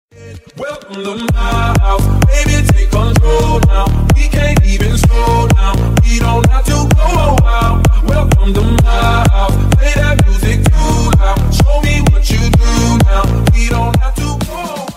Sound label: follower sub donate sound effect alert; twitch